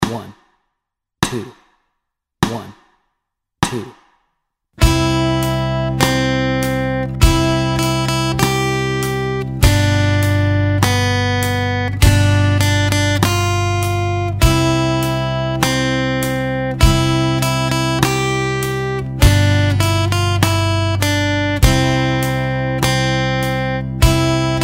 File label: Skip To My Lou Melody and Chords Track, guitar